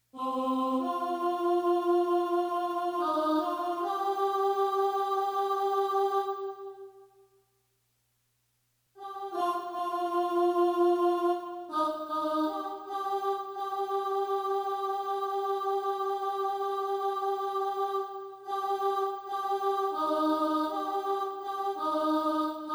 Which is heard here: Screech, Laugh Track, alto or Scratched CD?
alto